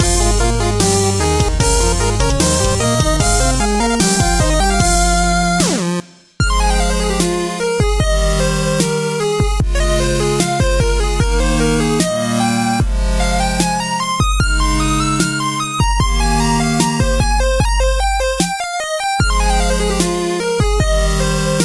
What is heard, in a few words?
Boss Win, gamerip